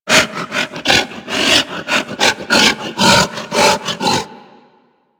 Feral Vocal Breath Run; Warframe; Kubrow

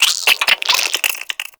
Infested Minion Attack, Vocals; Warframe

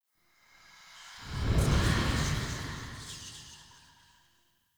End Whoosh; Echo Young Deora

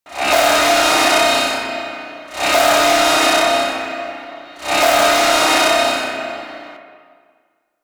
Ship Alarm; Warframe; Protea Quest

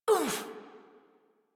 Pain, Adult; Warframe; New War; Adult Operator

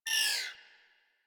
Energy Rifle Projectile; Warframe; Weapons

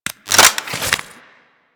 Minigun Reload

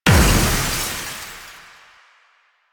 Big Glass TV Destroy

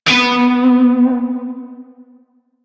Shawzin Flourish Oct Two B; Music; Warframe; Narmer Shawzin

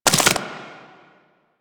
Proto Infested Maggot Step; Warframe

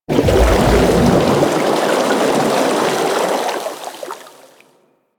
Ocean Water Rise; Ambience; Warframe; Grineer Ocean

